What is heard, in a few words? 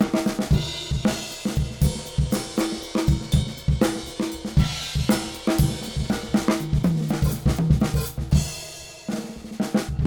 Acoustic Drums - Old School Drums; TransGainer